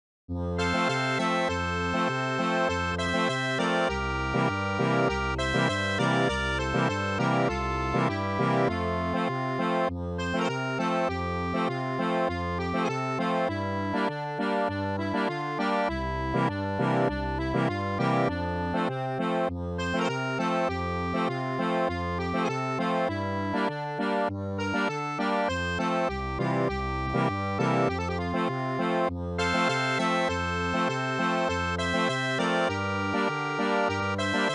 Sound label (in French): accordeon